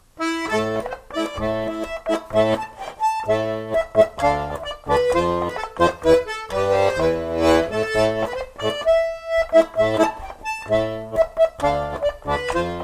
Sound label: Bourrée; d'Aurore Sand